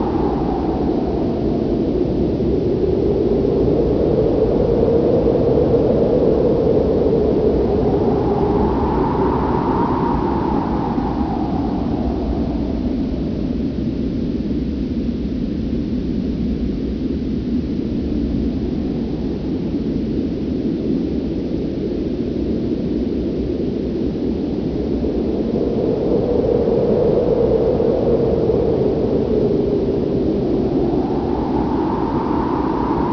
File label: base wind